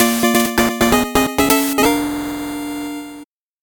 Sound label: 8bit jingle slow